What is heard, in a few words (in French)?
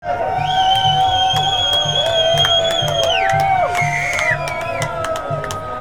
foule sifflets